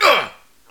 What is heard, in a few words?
hurt; metal bullets; player